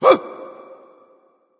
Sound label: grunt hurt; smite; voicepack; hun batz